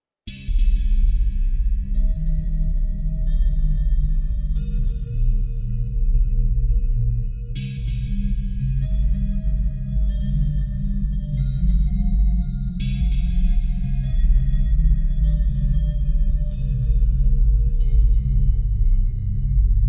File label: SY85 Original; 1H6